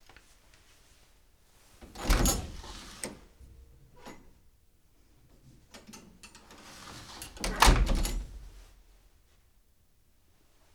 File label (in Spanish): Abir Y Cerrar Puerta